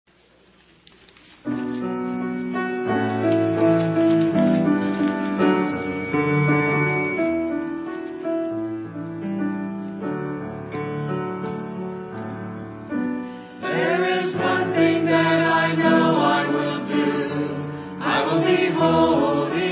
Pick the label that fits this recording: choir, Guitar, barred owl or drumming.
choir